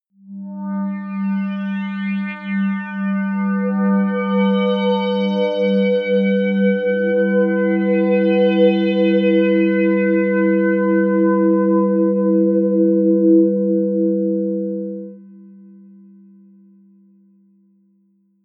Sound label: resonance fifth, Pads; flaechen